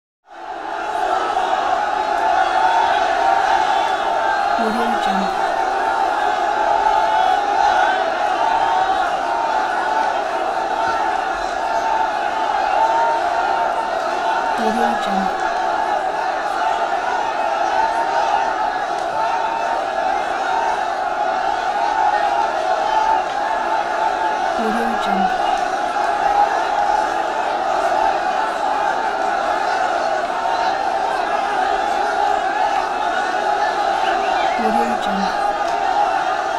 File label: exterior large arab crowd